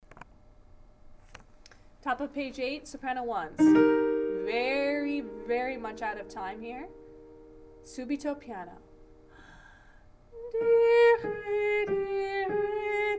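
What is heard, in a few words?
SSA Soprano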